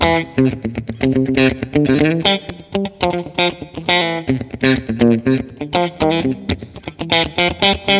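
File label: GIT-CHRDLICK, guitar chord lick, Disco; Best Service ProSamples vol.48 - Disco Fever; AKAI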